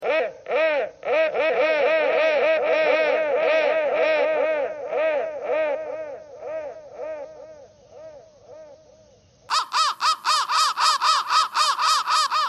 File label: crow crow threads